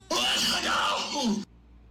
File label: loud sneeze